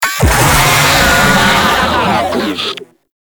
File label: Player Spotted, Vocals; Warframe; Proto Infested; Babau